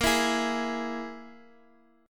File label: G sharp Suspended 2nd Sharp 5th, guitar chord; x,x,x,3,5,4